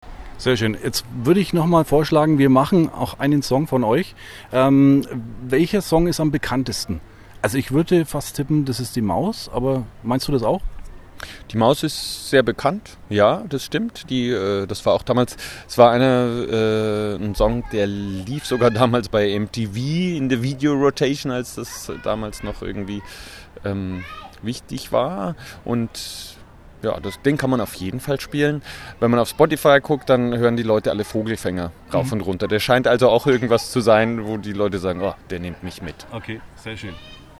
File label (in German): Song Vogelfänger